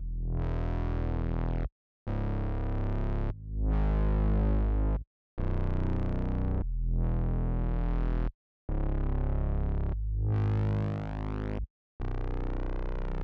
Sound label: 145 BPM G# Min Bass; Cymatics; Miami Guitar Pack - Pt 2; Stuck